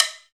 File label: CowBells